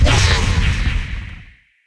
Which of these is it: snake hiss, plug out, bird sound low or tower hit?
tower hit